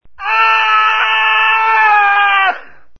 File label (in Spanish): gritos; Sonidos/misterio